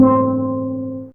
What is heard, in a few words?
Steel C3, Fairlight CMI IIe, Mallets